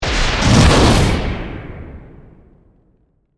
Magic Spell Hit, Horror, Magic, Spell, Hit to Whoosh; BlastWave